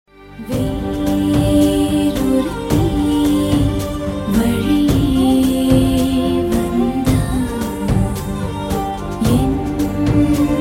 female love; tamil; bhahubali 2

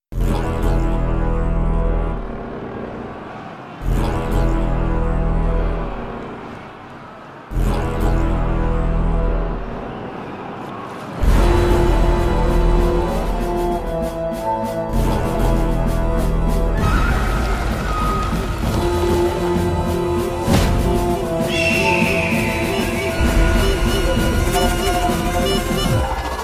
teaser bgm ringtone; telugu; sye raa narasimha reddy

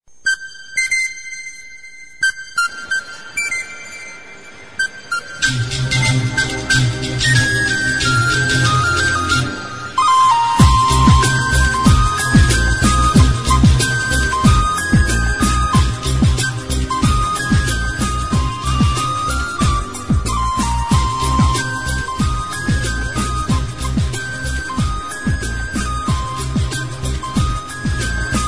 indian flute

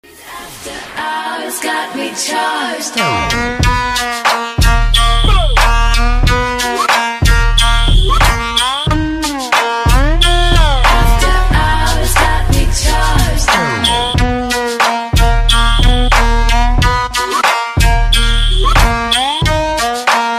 remix ringtone

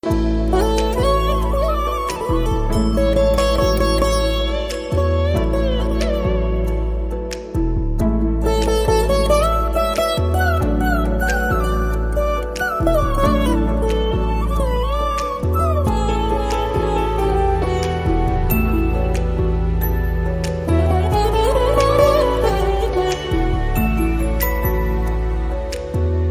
love bgm ringtone

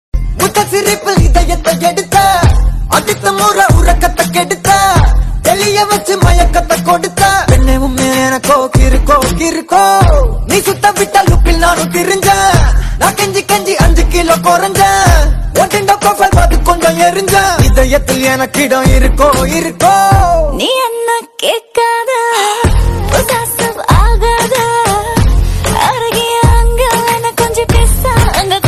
tamil beat music

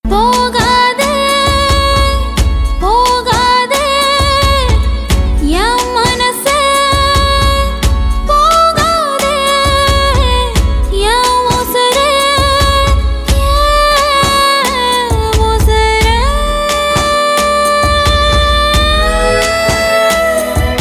female; tamil; chennai2singapore

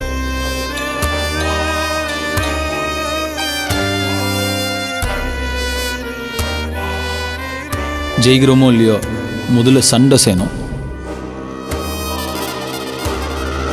bgm ringtone; vadachennai

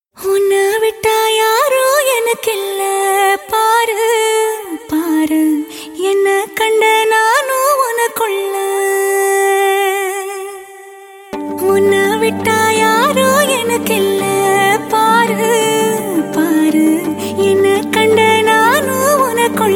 female ringtone; tamil; seema raja

